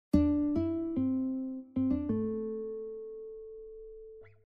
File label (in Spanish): gtr otro